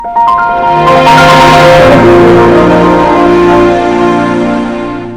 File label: windows 2000 louder